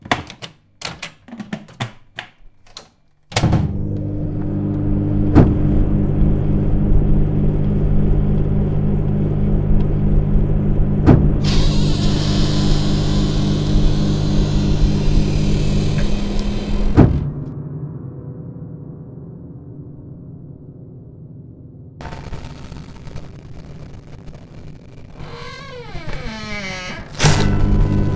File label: Domestic Noise Terror; Homesick domestic noise terror